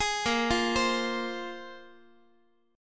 A sharp 7th Suspended 2nd down Guitar 4A 4E 5A 5D 5G sharp 6C sharp; arpeggiated; JGuitar